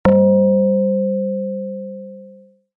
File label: tone